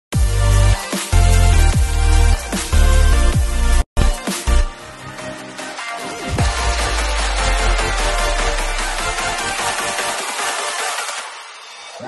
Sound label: automation bass; futurebass flstudio